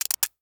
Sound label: Syringe Squirt; Medical; Blastwave